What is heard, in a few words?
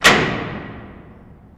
Jail Door Lever Sing; jail & prison doors